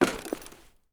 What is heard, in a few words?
tin, human step; Soundscape Overhaul